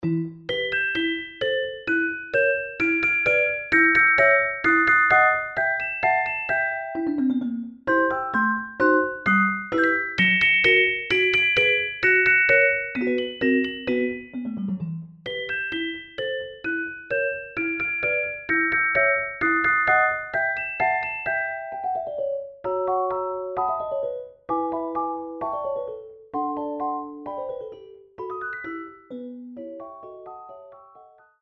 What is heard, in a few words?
celesta; pianoteq pro 6